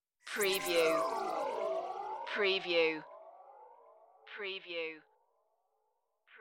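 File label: Creepy High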